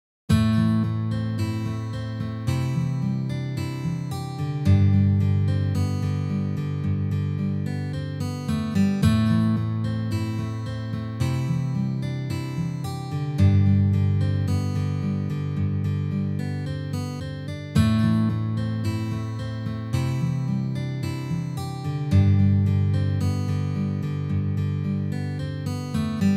na gitare

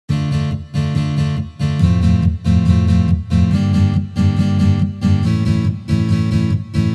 boj

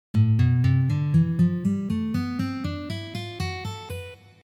Eolijskij lad

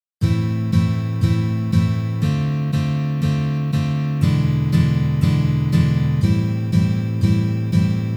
Uprazhnenie c akkordom E7

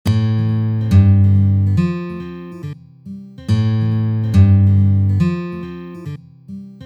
perebor

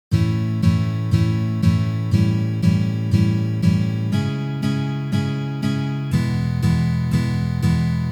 Uprazhnenie s akkordom A7

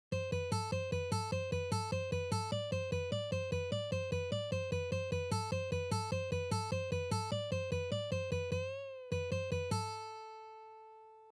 Vertushki na gitare; taby

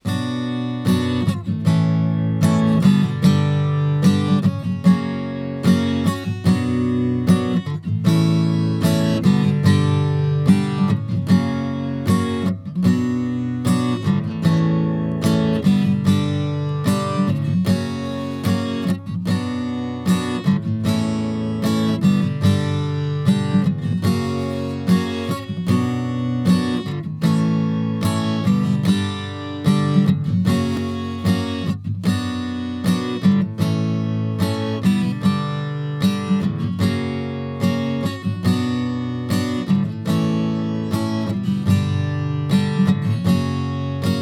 boj na gitare

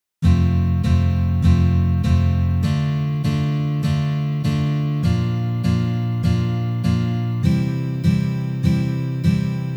Uprazhneniya dlya igry akkorda Fa mazhor na gitare

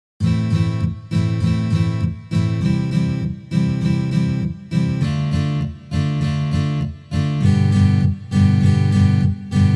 Primer akkompanementa ritm

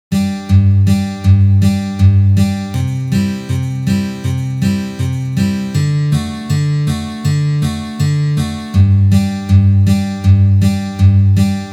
Boj Kantri na gitare

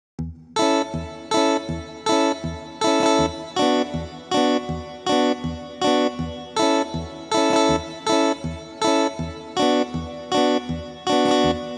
Ritmicheskij risunok Reggi

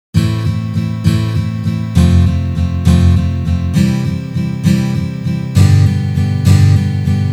Valsovyj boj na gitare

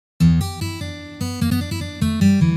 Blyuzovye liki i uprazhneniya

